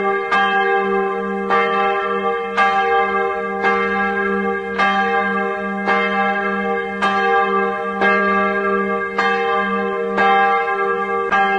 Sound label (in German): Offenbarungsglocke g1